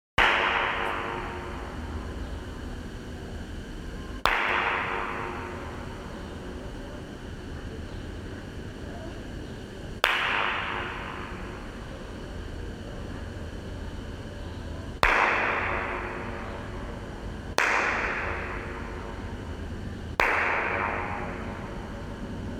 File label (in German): Instrument in G, the space transforms into an acoustic instrument; Soundwalk; visitors can explore playfully; A Soundwalk for Klangspuren Festival 2025